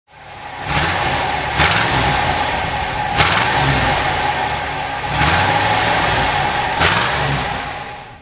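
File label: thunderbird 56 throttle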